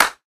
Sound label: taunt hard clap; video games; source engine; tf